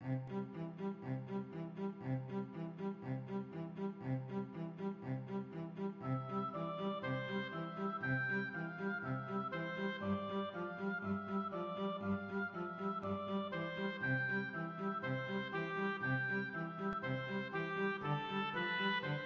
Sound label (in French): animato pour haubois violoncelle; alexandre pappas